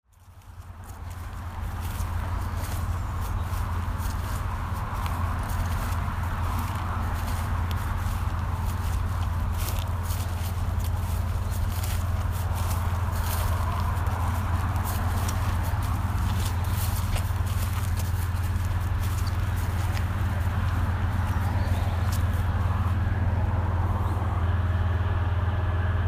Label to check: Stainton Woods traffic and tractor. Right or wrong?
Right